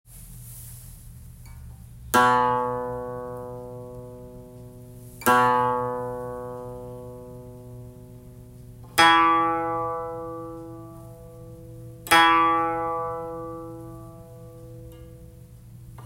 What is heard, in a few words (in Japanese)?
箏 調弦; B E A B D E F A B D E A B